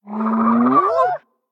Tapejara sound

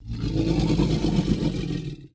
stego voc mumble

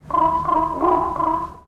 Quetzal mumble

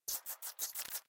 ant mumble

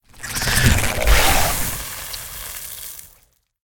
Arthropluera Attack Acid Spit

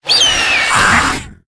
Argentavis sound

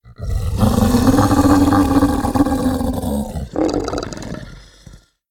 Purlovia sound

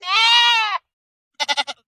Shine horn sound

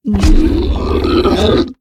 Therizino sound

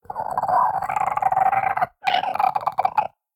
Pelagornis sound

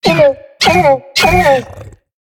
raptor sound

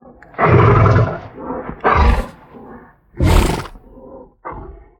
Ankylo sound

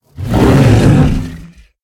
Gigantosaurus Startled

